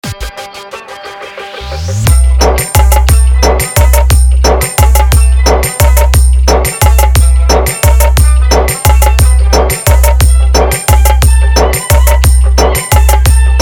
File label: Bengali Super Hit Love Romantic Mix